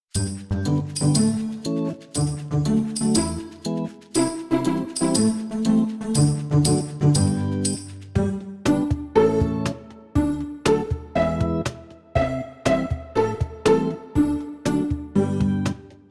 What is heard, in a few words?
pizzicato plink; join in with music; A fun song for which beginner violinists can pluck their open strings to fit the tune